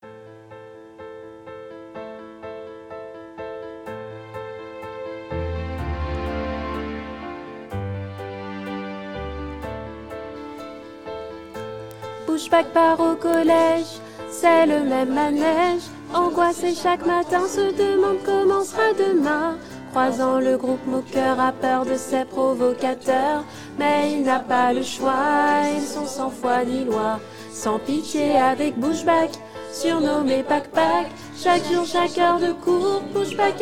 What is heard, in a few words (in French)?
choeur